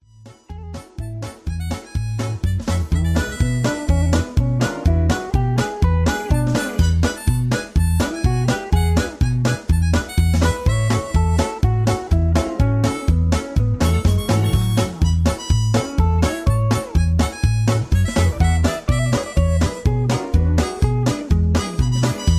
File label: High Key